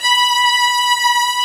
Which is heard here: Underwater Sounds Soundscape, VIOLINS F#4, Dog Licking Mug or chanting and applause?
VIOLINS F#4